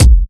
rich high end kick drum sound a sharp key, bass drum single hit